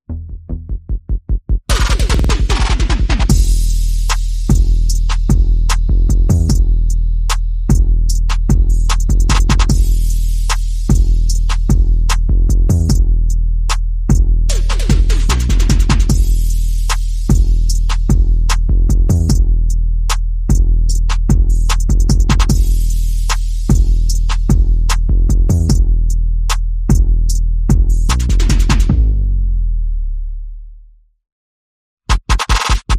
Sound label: Bass Perc and FX Only